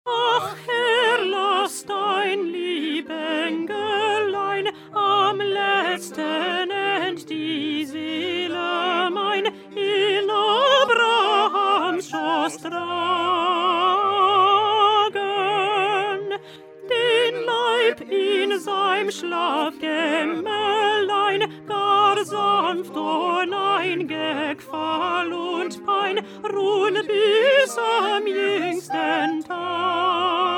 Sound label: Alto